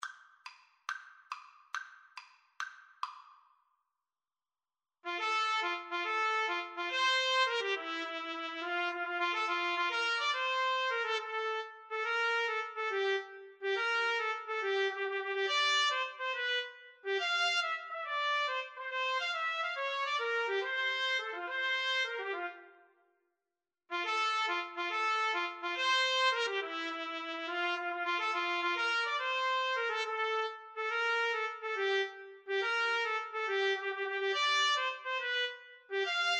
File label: trumpet duet